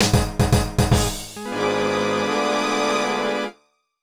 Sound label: Horror Lose; Intermissions + Nanogames